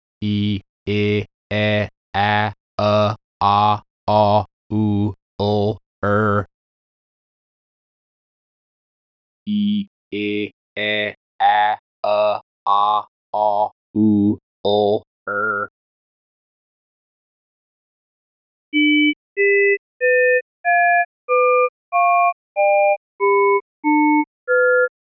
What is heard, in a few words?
Norm, Emph. Sine Vowels